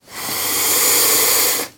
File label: Lizard Water Monitor